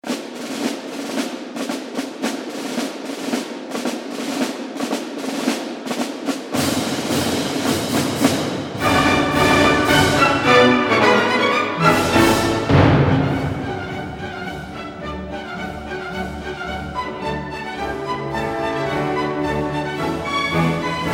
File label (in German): Marsch; Radetzky